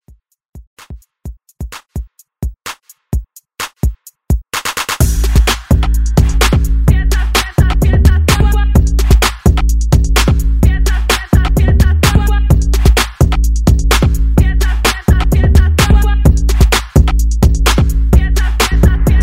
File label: Hype Redrum